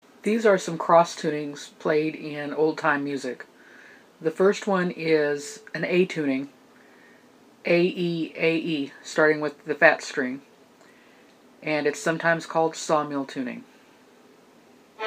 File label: Fiddle tuning Cross tunings for Old Time